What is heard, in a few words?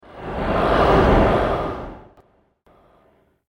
Sturdy Sweep, whoosh; Whoosh Sound Effects